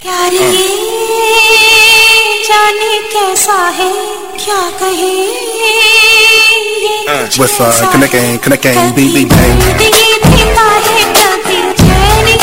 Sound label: love pain; jazz